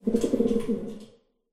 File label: amb nature pigeons